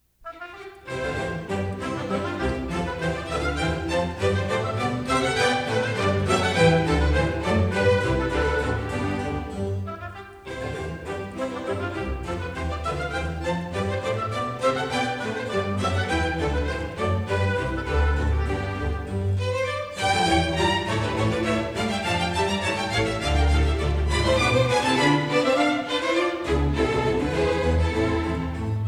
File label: Bach Orchestral Suite No1 BWV1066; Scherchen